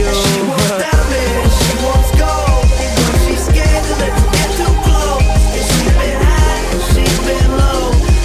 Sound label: diamond alert